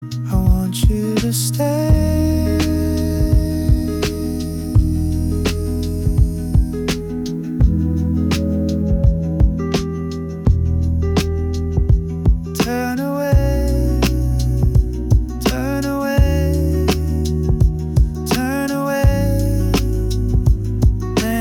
lo fi hip hop

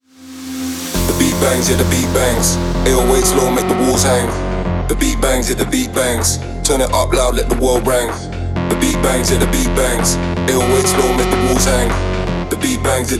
The Beat Bangs

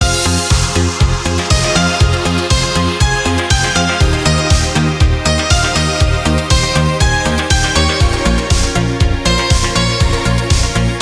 Piano Tears

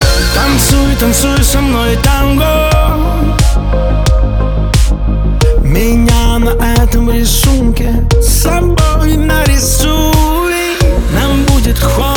tango

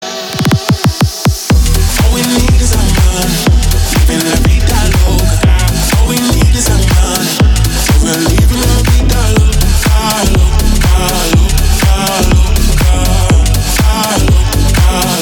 speed up

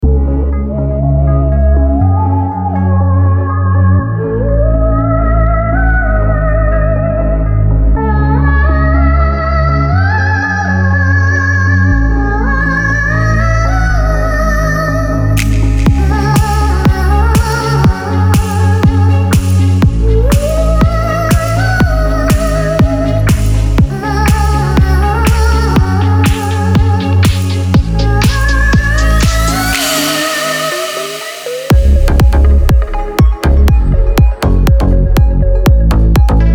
speed up

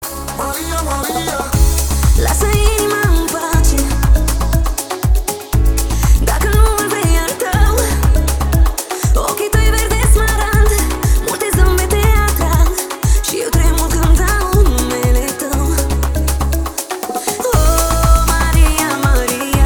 Violin Remix

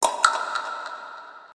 tongue popping

